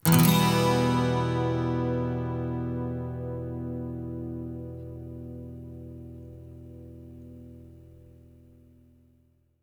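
12 string minor E, Electric & Acoustic Guitar Loops; USB Soundscan vol.04; AKAI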